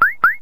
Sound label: click sound